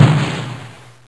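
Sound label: plasma hit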